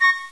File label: pipe